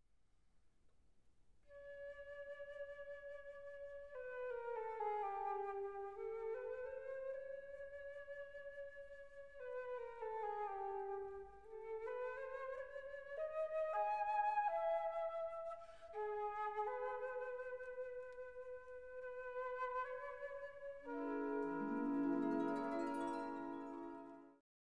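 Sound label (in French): flûte Debussy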